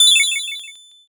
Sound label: ice magic